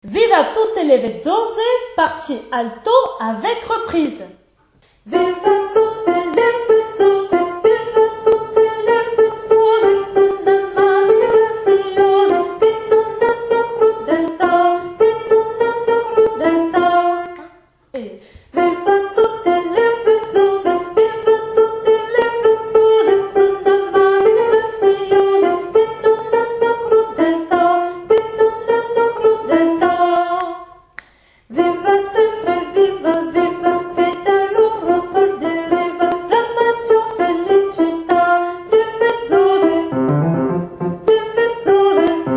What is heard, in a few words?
Alto; Viva Tutte; Giardini